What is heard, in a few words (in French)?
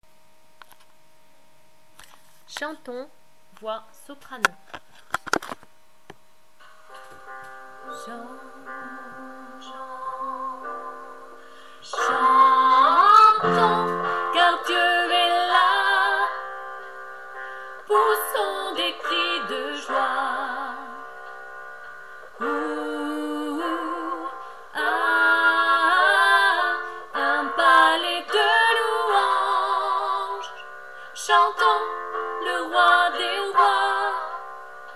Chantons Duo des voix